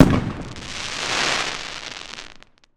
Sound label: firework explosion fizz; sound effects one